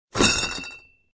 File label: Beer tilt; RadwinIsland